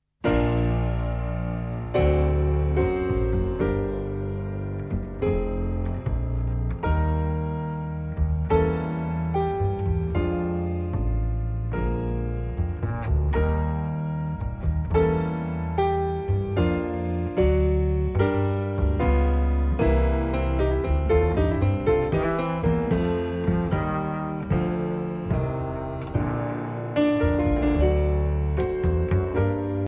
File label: Quartet, Blues